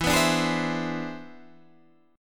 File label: E 7th Flat 13th; E7b13 strummed; JGuitar